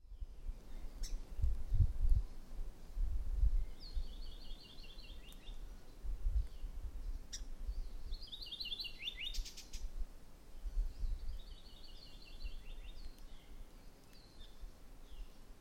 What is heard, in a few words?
Maui Parrotbill Pseudonestor xanthophrys